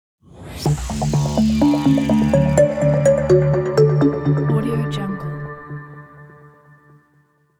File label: corporate soft technology logo; tomasz-redman